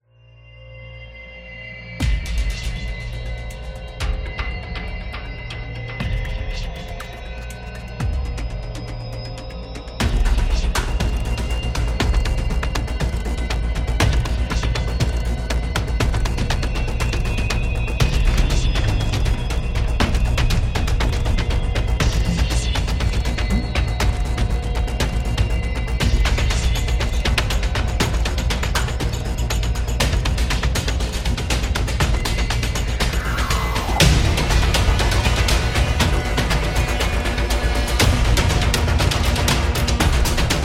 Suspense

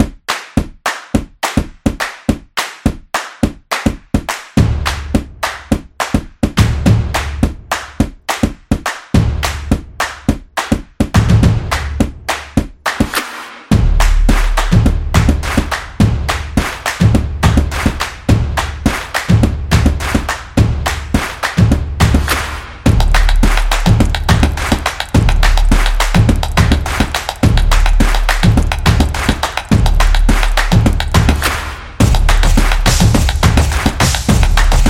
Drums Beat

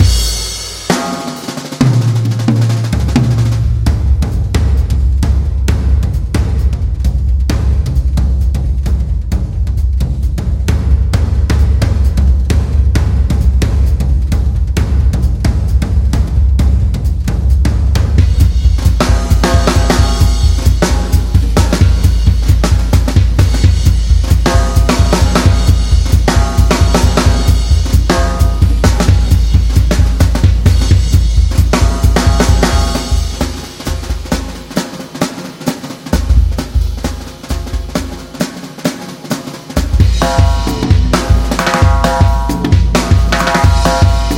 Jazz Drums; street art

